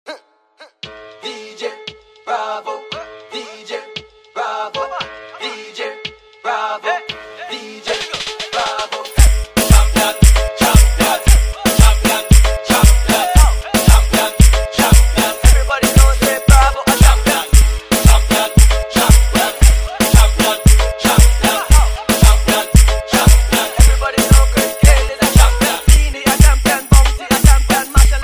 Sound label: trance sad